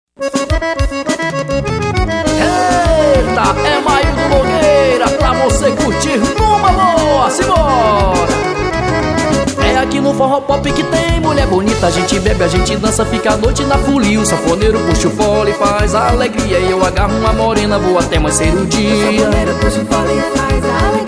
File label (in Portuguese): forro pop